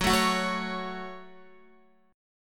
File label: F sharp Minor F sharp, Guitar; strummed; JGuitar